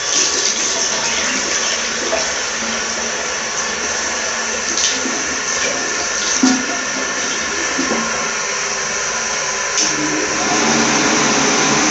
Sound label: hand dryer solos, crypt of the Almudena Cathedral; neo-romanesque; palacio